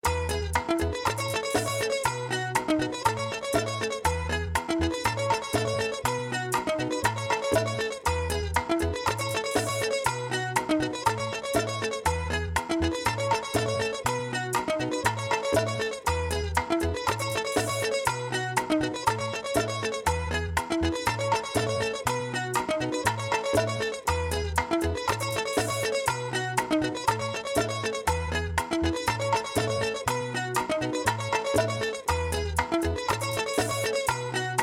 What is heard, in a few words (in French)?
rythmique bachata